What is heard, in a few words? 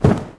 Sits down; Buffet; Neighbours From Hell Console Edition; GC, Xbox